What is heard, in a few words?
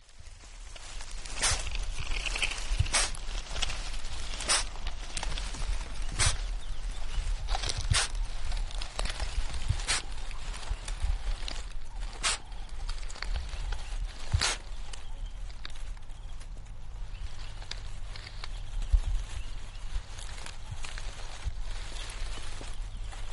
sunda pangolin